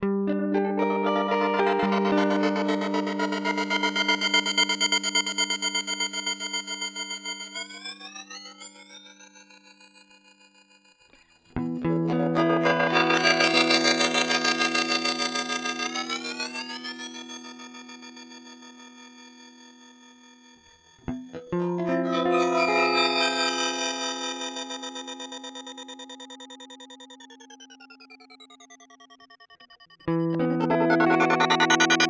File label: Mooer D7 X2 Rainbow